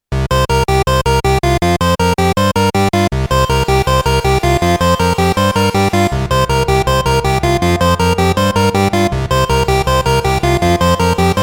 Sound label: tx6 Reverb